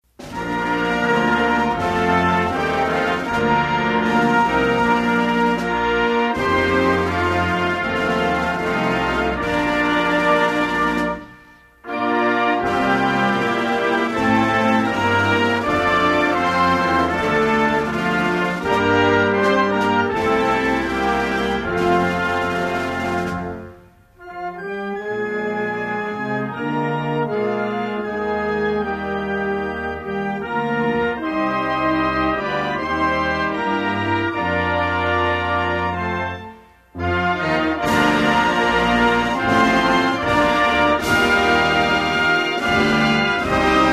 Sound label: National Anthem of Guyana